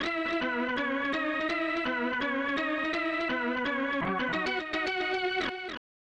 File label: Baseball Fanfare; Ken Griffey Jr. Presents Major League Baseball; SNES